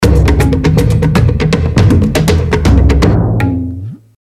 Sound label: Jingle Run